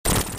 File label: sonic hits; pranks